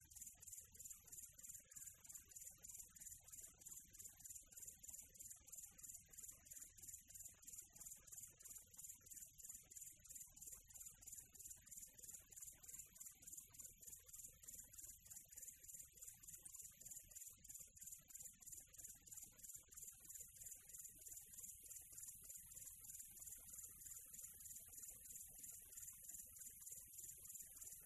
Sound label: NATURE Quiet Tropical Atmo Cricket; smartsound